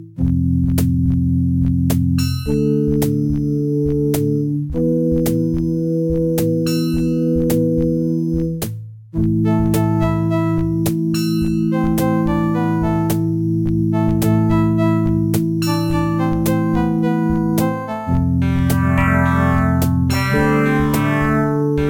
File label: hihat